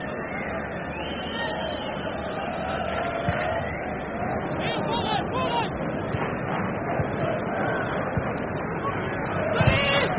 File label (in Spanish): sonidos de estadio